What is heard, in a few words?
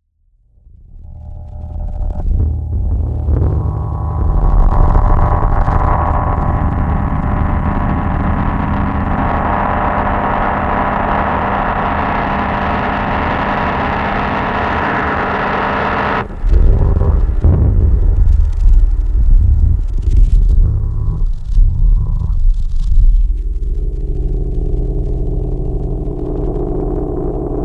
Interference Field Transmission